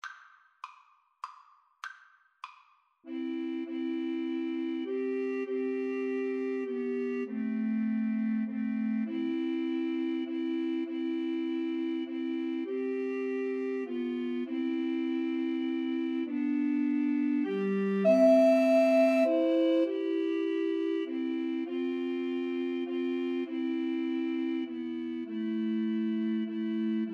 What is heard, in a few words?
amazing grace, Recorder Trio